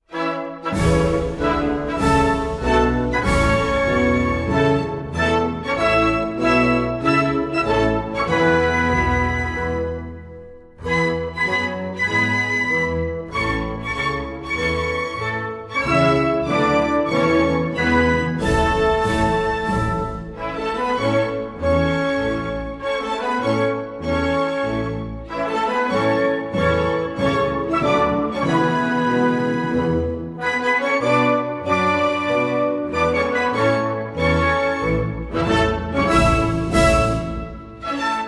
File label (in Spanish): Himno nacional de Guinea Ecuatorial